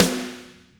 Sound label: soft hit whistle; osu